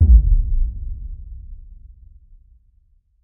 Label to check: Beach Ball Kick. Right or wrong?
Wrong